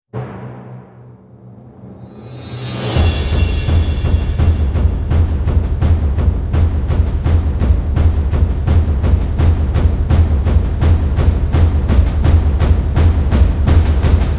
percussion only

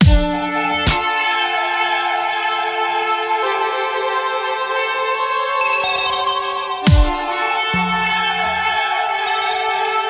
dark dubstep glitch

